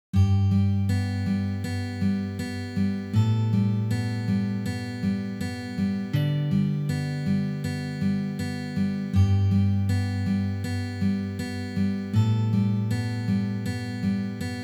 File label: Drone Notes